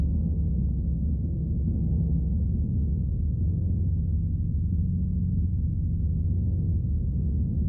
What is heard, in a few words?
noise